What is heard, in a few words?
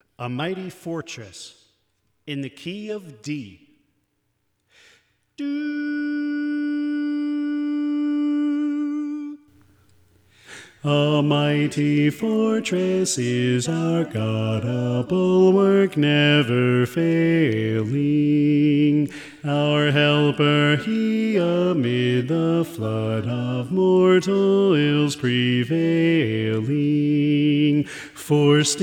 Bass; A Mighty Fortress